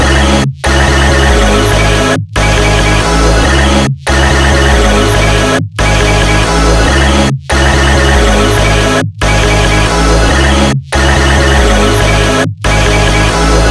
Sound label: night drive